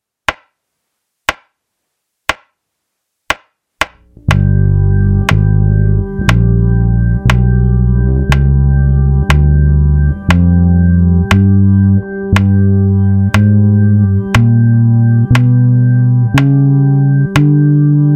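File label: Symmetric diminished half whole, bass; a bassists guide to modes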